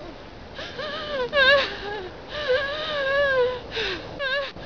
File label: crying women